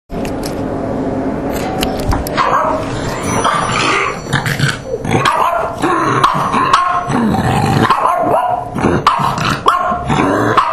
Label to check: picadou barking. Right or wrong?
Right